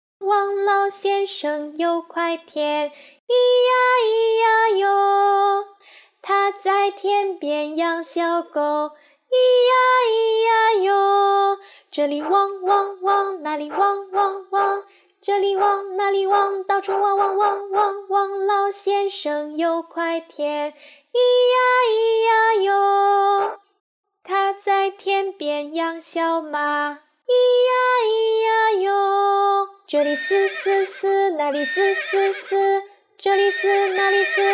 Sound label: Old Mr. Wang Song; farm animals; teacher materials